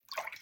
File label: water